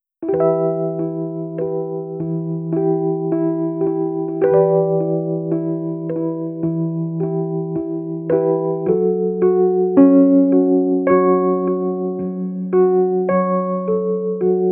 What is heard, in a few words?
Afternoon Crickets (Instrumental)